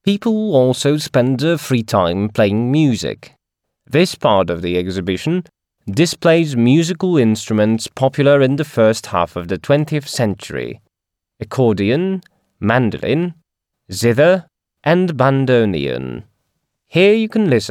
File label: Instruments